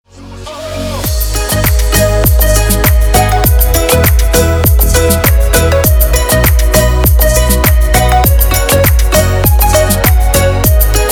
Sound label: tropical house mix